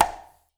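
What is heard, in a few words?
conga slap right; Spectrasonics; Liquid Grooves 1 & 2; CONGAS+BGOS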